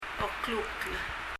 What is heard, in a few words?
coughing; ouklukl [ɔkl(u)kl]; 咳がでる